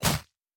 Hitting Short

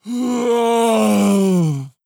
Yawns Goddess

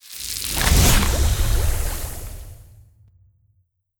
Succubus Infect

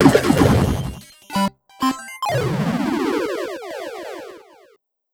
Gameover; NEEDY STREAMER OVERLOAD Soundtrack vol.2

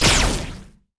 Lightning

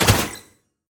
SFX Battle Basic Damage; SFXBattleBasicDamage1

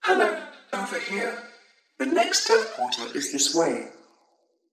Glitch Construction

